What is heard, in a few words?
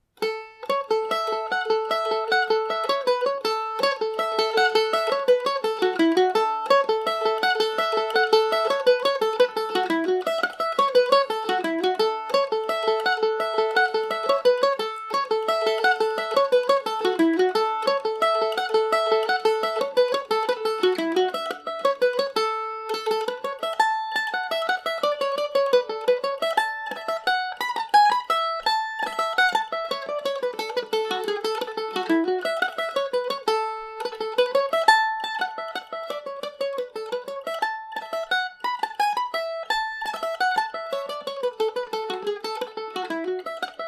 reel, irish mandolin; reconciliation